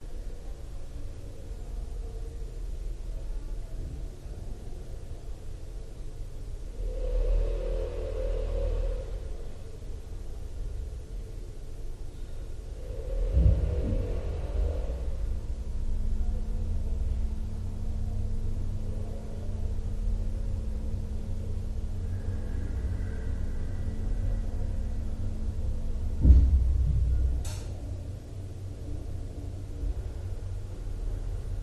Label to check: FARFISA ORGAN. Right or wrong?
Wrong